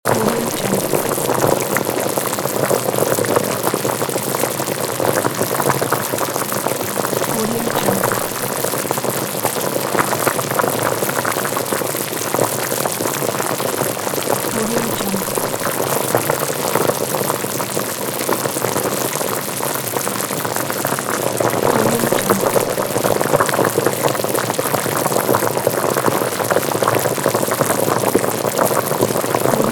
boiling water

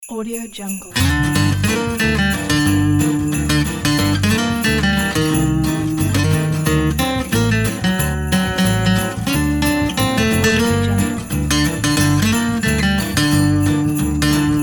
manouche christmas

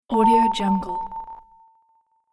sine tone delay

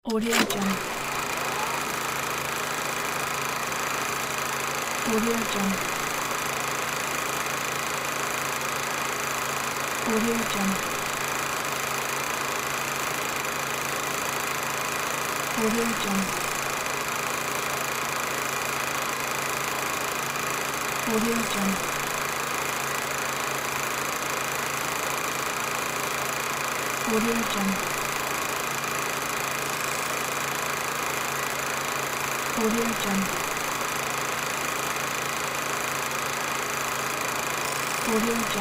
film projector

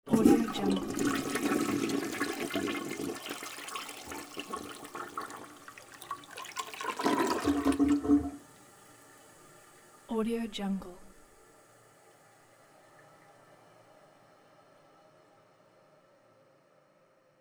toilet flush; mraetzelmedia

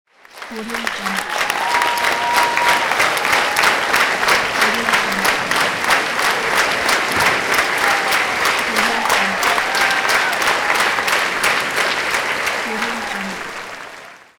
applause

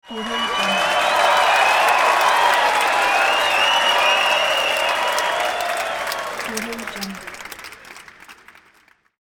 crowd cheers

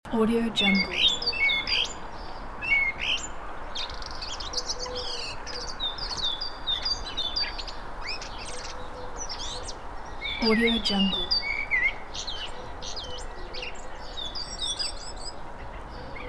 early spring urban birds ambience loop

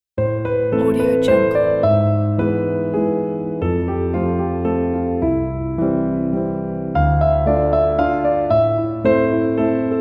waltz

